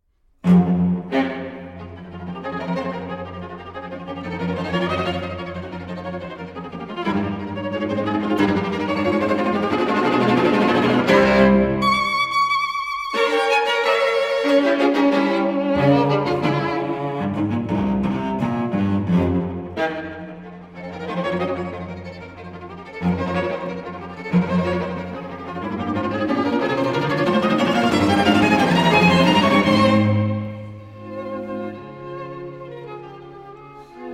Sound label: String Quartet in F Minor, Allegro Vivace Assai